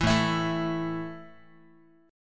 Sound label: A 5th A down Guitar 4A,4E,5A,5D,5G sharp,6C sharp, A5 Chord; strummed; JGuitar